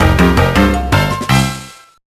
Win a Race; Tak The Great Juju Challenge; GBA

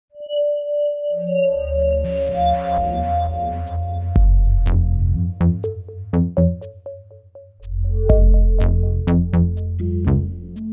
music loading

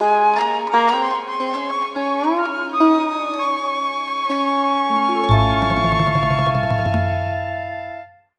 Bari Sax

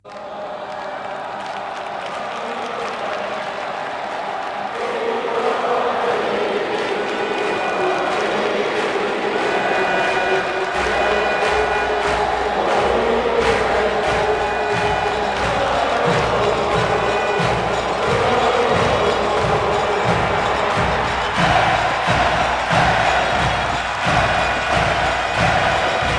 Chants - Middle East Type

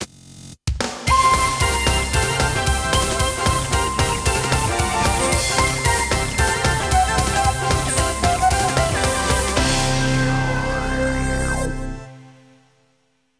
Goal; Speed Friend; Daytona USA 2001; 2000 Dreamcast gamerip

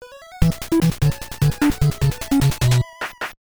Game Start

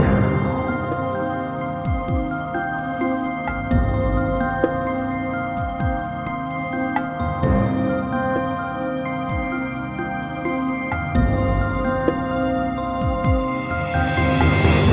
Menu BGM; The Weakest Link; PS2

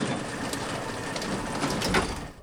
elevator doors open

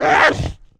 Wolf, wolf attack

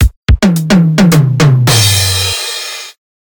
Transition; Everspace 2

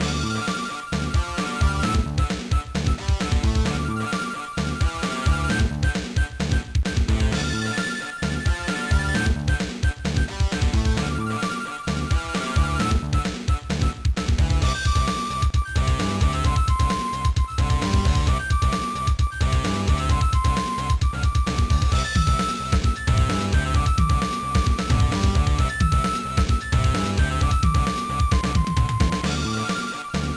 BGM; card party 2002 gba

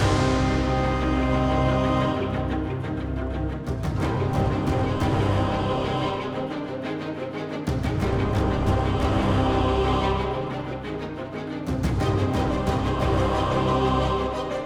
MAGE BOSS

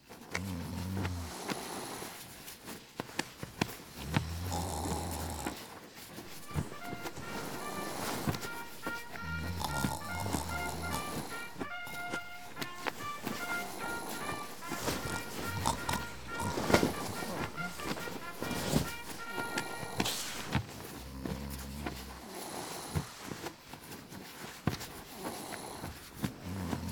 Camp Sounds

